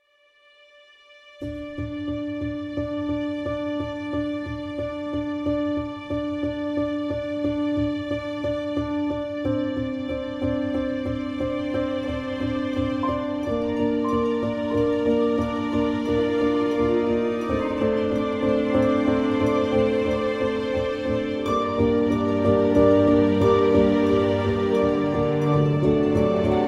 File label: film music